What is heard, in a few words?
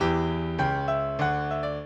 minuet, mozart